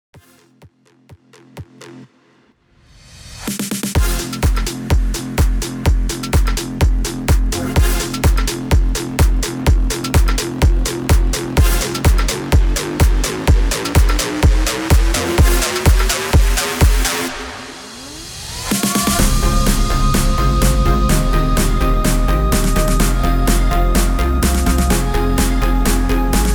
126 BPM

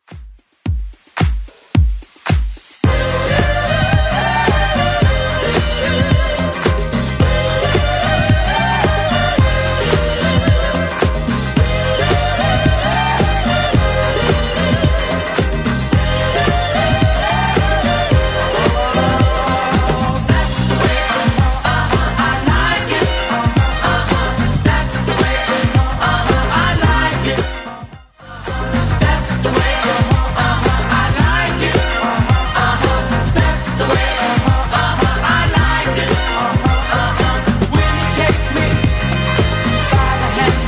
70s Club 110 bpm

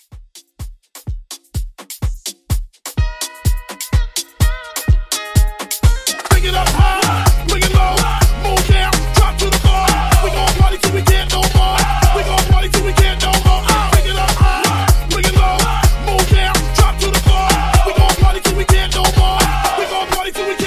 Afro Mixshow

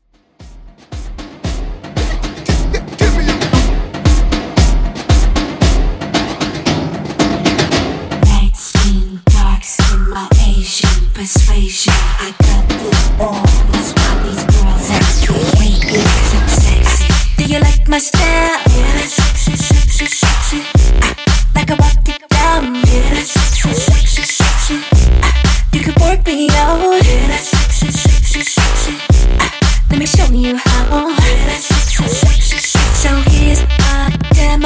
Extended 115Bpm

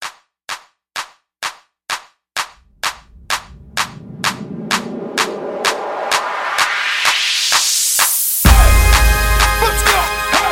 Classic Chant Intro

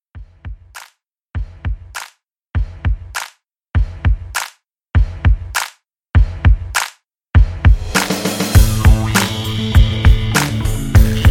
70s Rock 100

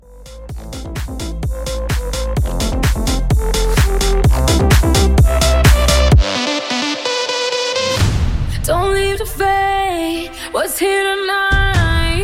Kuduro Hybrid Mashup 128

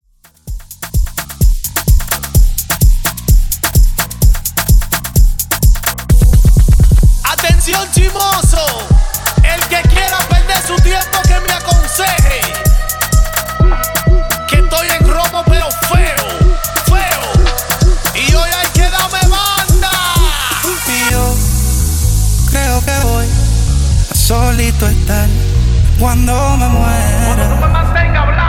Urbano 128Bpm

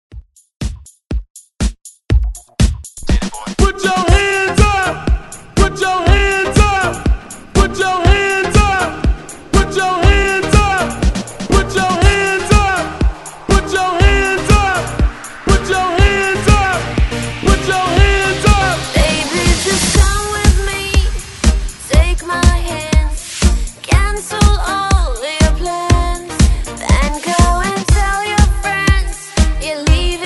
121Bpm

